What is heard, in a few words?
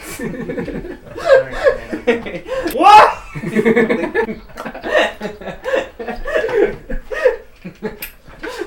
laughing group